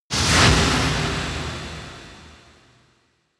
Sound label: whoosh swoosh burn large; swooshes-swishes